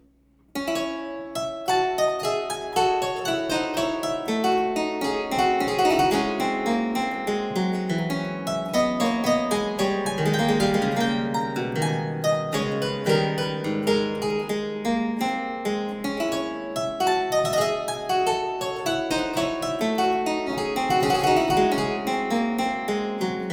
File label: French Suite No. 1 in D Minor, Menuet; Johann Sebastian Bach